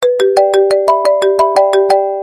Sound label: iPhone XS Original, ringetone